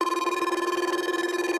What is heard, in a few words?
Base Tally Loop; Mahi Gaming